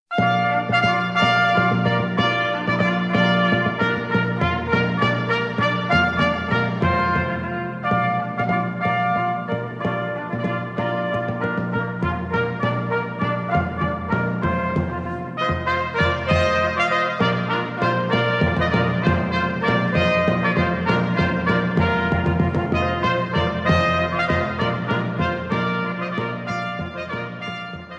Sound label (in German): 3 trompetenaufzuege